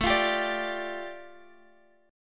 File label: A 7th Suspended 4th Sharp 5th A, Guitar Standard; strummed; x,x,7,10,8,10; capo=2